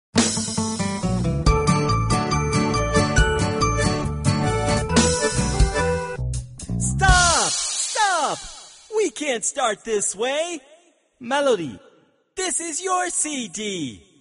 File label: melody